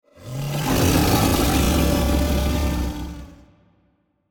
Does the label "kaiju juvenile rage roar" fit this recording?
Yes